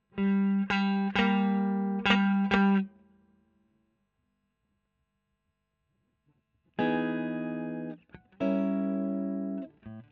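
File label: electric guitar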